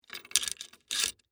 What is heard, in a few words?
Door Lock Slide, Foley, Door, Lock, Slide, Chain, Lock; Blast Wave